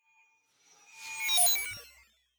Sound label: fly by drone digital